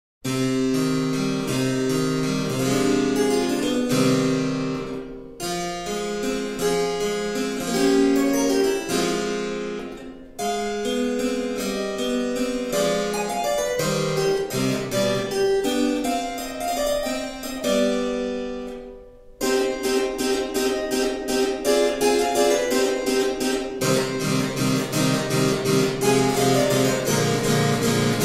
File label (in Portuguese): Piano Ligacao de Pianoforte; gualaxo do norte a escuta estrangeira